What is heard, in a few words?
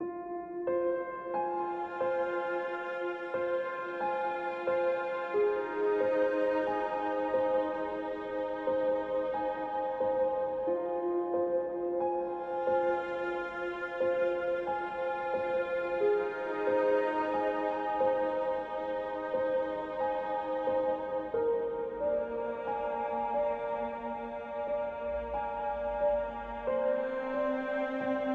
Ambient Sadness; Music; NWNDS; Intensity 2; Ambient music pack